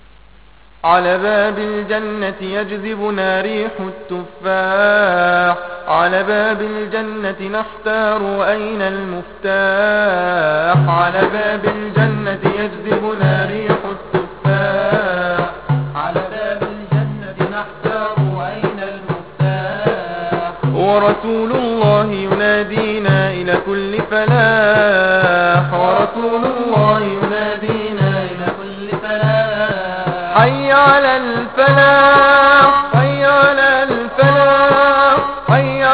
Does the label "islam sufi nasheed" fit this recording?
Yes